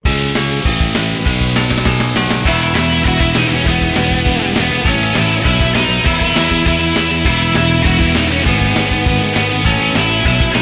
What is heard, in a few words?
Ding Dong; Arena